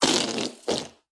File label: Fart Noise; SoundsCrate